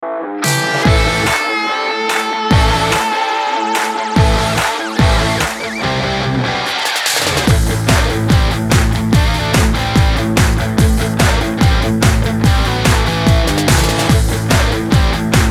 Carnival Energizing Dance Rock; Ultimate Zombie Defense; Windows

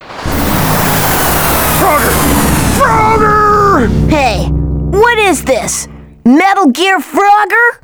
Game Over; Frogger Beyond; GC PS2 Windows Xbox; Game Over Metal Gear

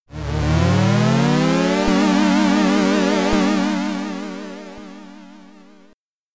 Game Over SID Stereo; Warhawk; Commodore 64

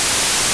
Spider Web; Pokemon; Gen 2; attack moves; GSC

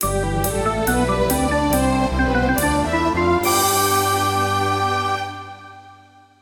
New Item; Rosenkreuzstilette

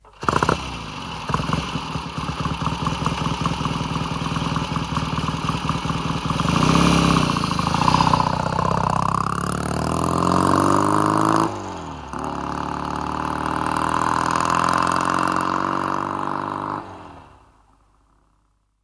Motorcycle; The 39 Steps

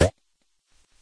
Jump; super minipix; android

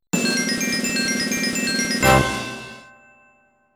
Mini-Game Victory

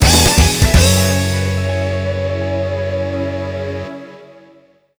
Goal; F355 Challenge Passione Rossa; Dreamcast

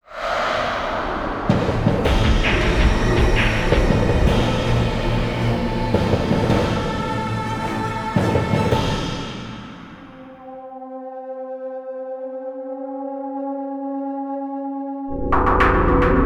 Industrial Gloom; Far Cry

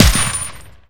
RPD

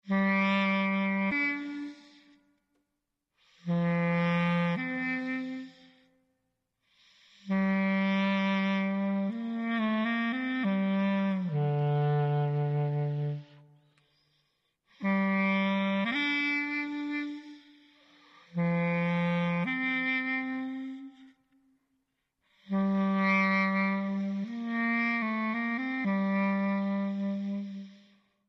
Dialog; the walking dead season one

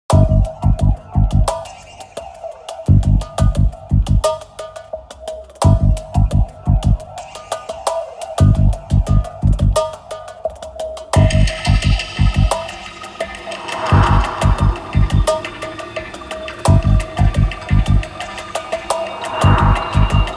disturbing picture; Ryu ga Gotoku of the End; Yakuza Dead Souls

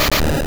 Body Slam; sfx; attack moves; pokemon; gen 2; gsc

